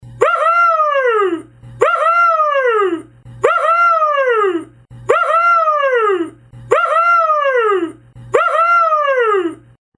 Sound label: whoohoo, ringtone